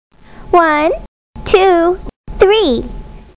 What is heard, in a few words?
bug; How Many Bugs in a Box